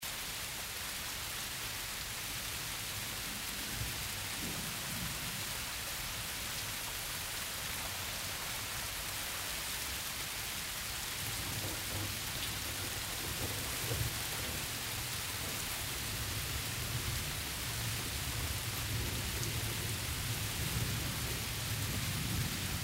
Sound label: Thunderstorm